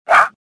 confuse; worker2

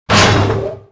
walk; Star Wars Jedi Knight II Jedi Outcast; XBOX; mark1

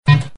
Keno BIIP

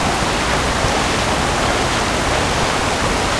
waterfall; Star Wars Knights Of The Old Republic II; XBOX

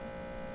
fluorescent tube hum

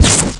Bullet Shield, Impacts

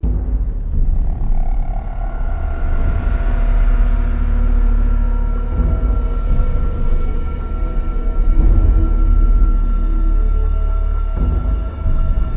echo long; Star Wars Knights Of The Old Republic II; XBOX

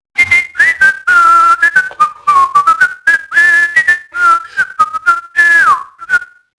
mobster noise; enemy; Max Payne

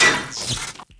electric panel, bullet hit; projectiles; Max Payne

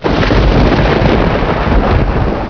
thunder; Chase Hollywood Stunt Driver; XBOX

